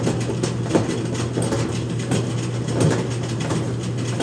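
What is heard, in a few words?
CONVEYOR, INDUSTRY; AKAI; 1000 Sound Effects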